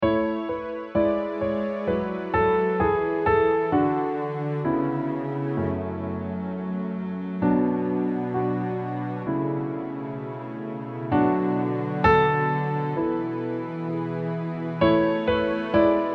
instrumental

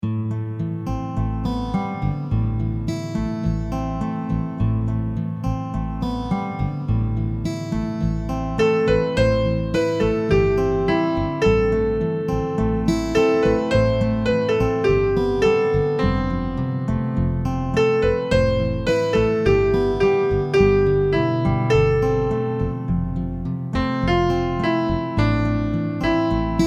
Love you BACKING; backing (w. melody)

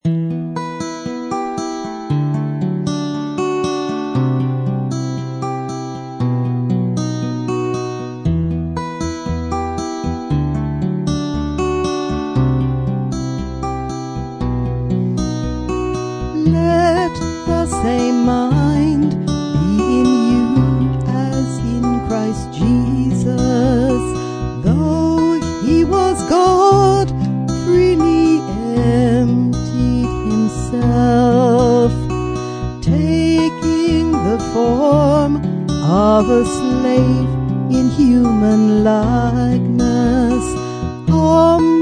Jesus is Lord VOCAL E min

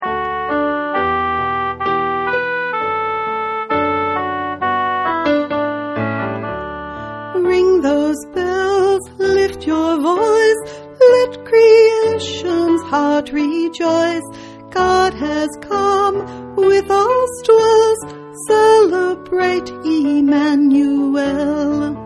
Emmanuel VOCAL D

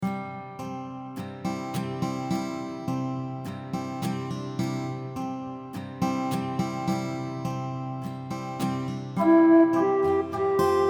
BACKING

